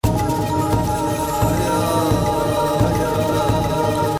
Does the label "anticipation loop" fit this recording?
Yes